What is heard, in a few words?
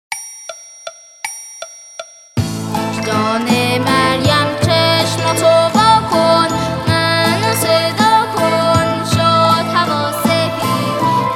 Vocal